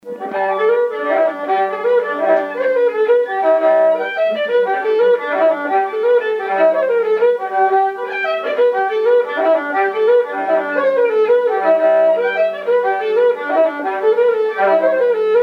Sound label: jig; Tell her I am